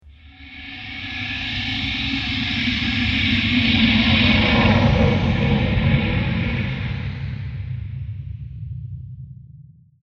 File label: Approching Transition Breathy; Scary Soundscapes; Horror; Crafted Signals